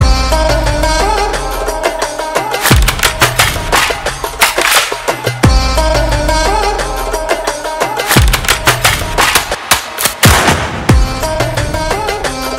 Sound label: BGM Instrumental, telugu